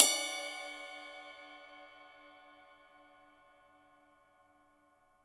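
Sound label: Cymbal, Real Drum Kit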